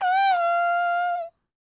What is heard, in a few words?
MMM, Vocal Blues Soul; Spectrasonics; Planet